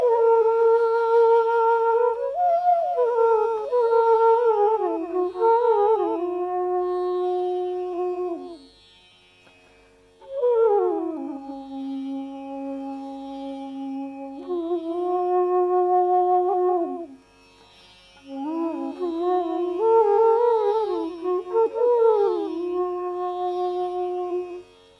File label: Raag Tilang - Flute